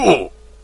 classic hurt

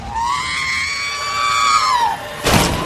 r2d2 scream

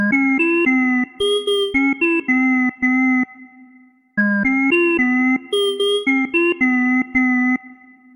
melody

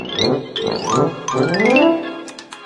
goose goose duck; dodo

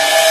riser; undertale

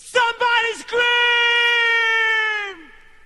somebody scream

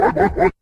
laugh; halo; elite

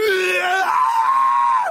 yell; flippy; happy tree friends

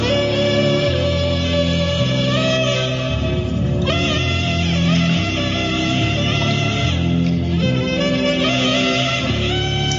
the saxophones getting louder

